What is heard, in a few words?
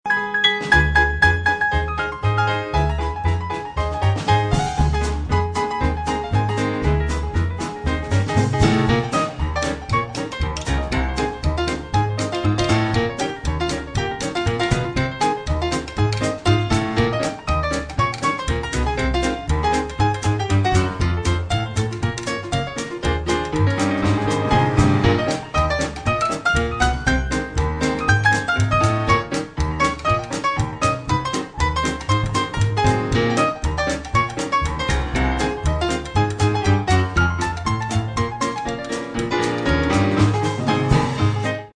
New Orleans Jazz Band modern; Maple Leaf Rag; big band 20s